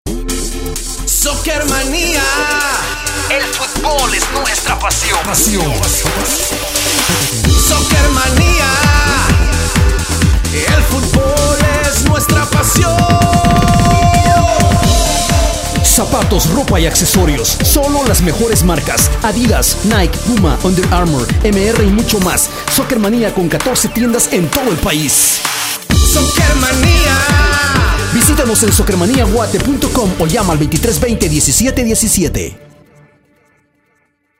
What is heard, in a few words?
Electronica